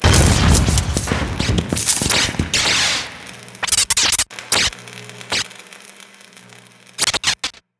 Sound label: ambient, advisor barn